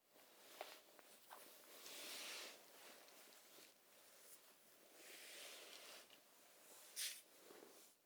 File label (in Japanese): 衣擦れ右へ